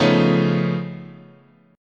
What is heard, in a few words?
C sharp Augmented 9th, guitar chord